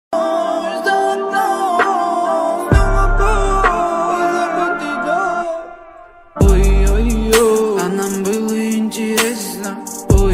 english ringtone bgm ringtone mood off ringtone sad ringtone